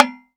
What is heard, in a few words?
Oct Tom, Roland SPD-S